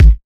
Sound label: smooth bottom end kickdrum g sharp key, Royality free kick drum single hit tuned to the G# note